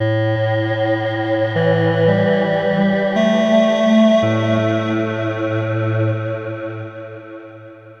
novation peak atmo lead; novation peak test

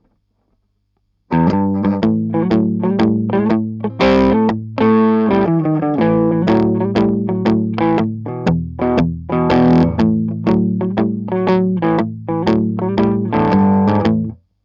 germanium drive smooth highs full boost; Chandler Limited

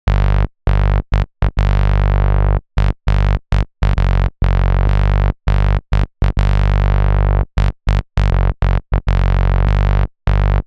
Moog multimode filter XL test bass; universal audio

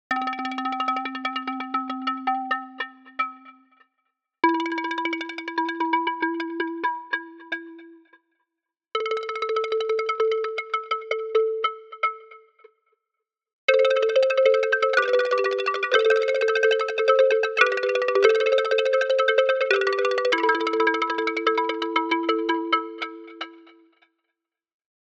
arturia pigments

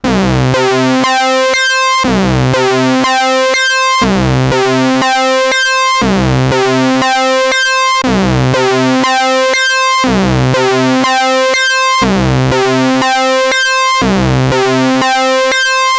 sync, arp odyssey; korg collection arp odyssey test

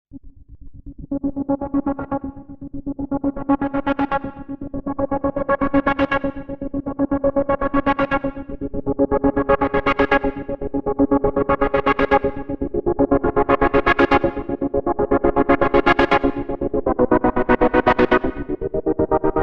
asm hydrasynth explorer test sound

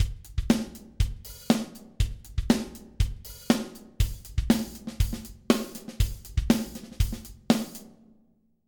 eventide 2016 stereo room drums drywet

